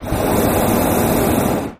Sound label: Hot Air Balloon Burner, Medium Hard On and Off